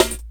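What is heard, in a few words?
SD -L, snare drum, Drum & Bass; Ueberschall; Drum & Bass Resonance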